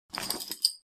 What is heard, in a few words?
Wear armor